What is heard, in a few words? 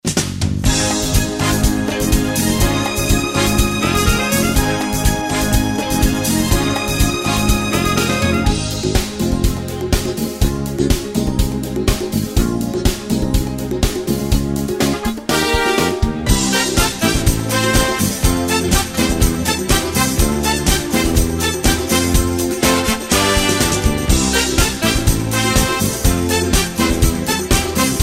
Disco